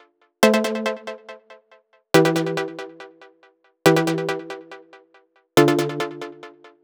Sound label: Stabs Bouncy Minor Synth A, Cinematic Trailer Loops